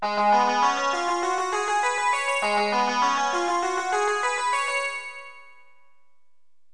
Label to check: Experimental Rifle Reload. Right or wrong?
Wrong